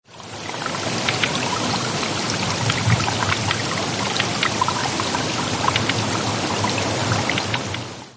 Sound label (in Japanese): joubi